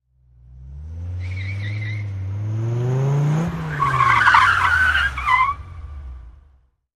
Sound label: Accelerate Quickly, sports car